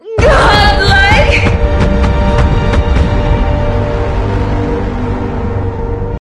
music stinger; killstreak music; UT2K4

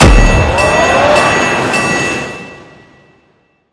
death slam cast delay, crowd hype; lash

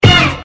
boost; Jet Set Radio movement sfx

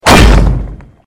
sigil slam explosion; billy ed edd n eddy sfx

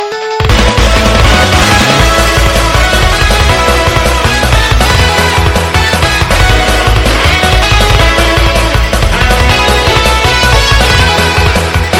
rage full; shiv; deltarune flowery over shiv abilities

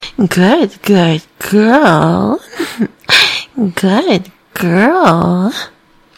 music stinger; killstreak music

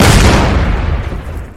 explode; criminality soundpack

